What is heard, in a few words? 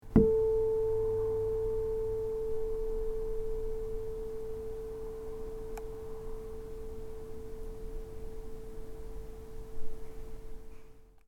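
onsa, tuning fork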